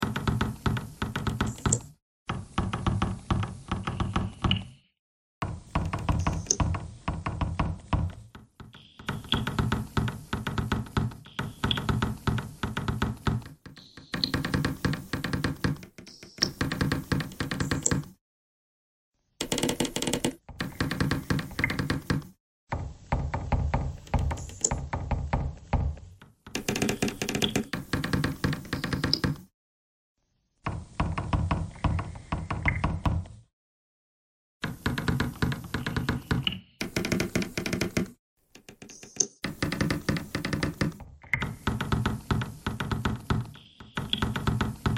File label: bagpipe noise